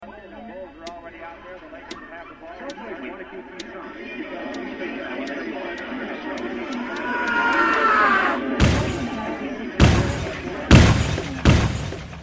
smashing TVs

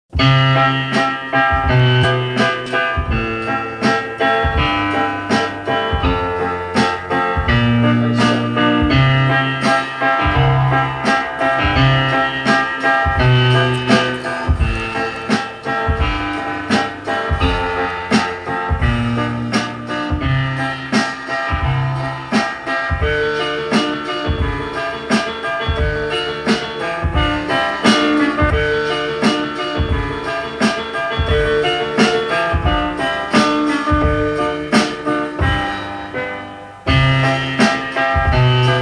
instrumental

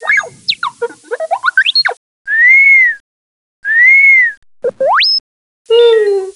worried; Star Wars; R2-D2

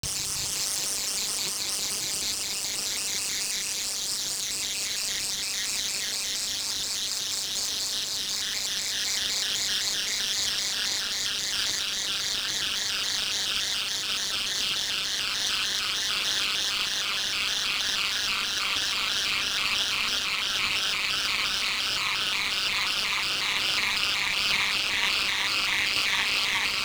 ufo

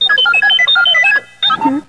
R2 Happy; Star Wars; R2-D2

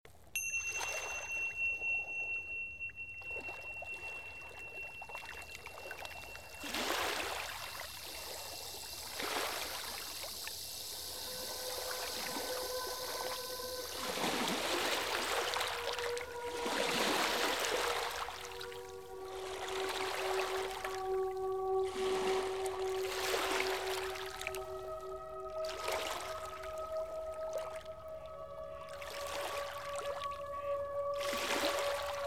Deep Relaxation; Ambience

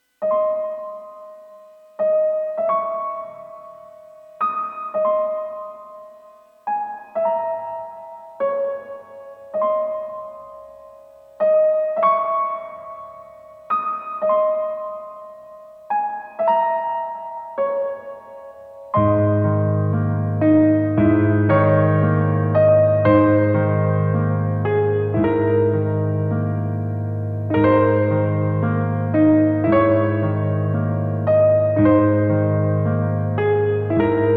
Chakra Tuning; Spiritual; Kundalini

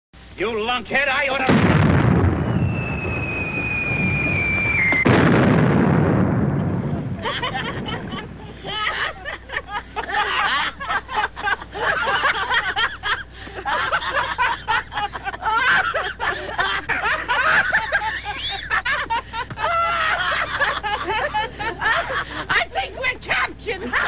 laughing gas; Three Stooges

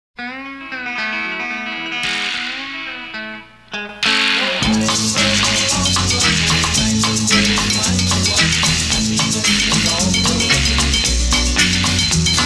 Karaoke

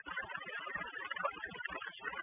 gasp; EVP

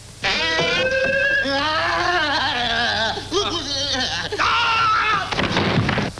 Yaaa yaaaaa; Three Stooges

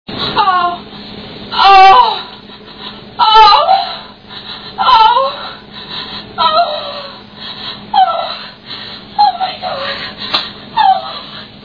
orgasm

